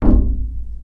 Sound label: glass sheet impact soft; physics; source engine; left 4 dead 2